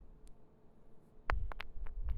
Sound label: Rock On Concrete